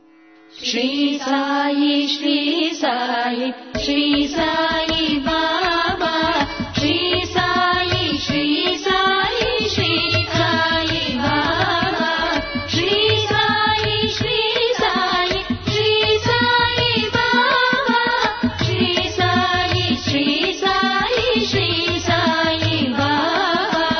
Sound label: song ringtone; sri sai baba